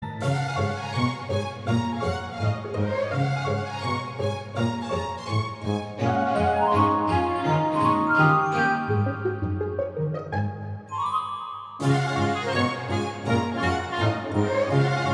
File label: Funny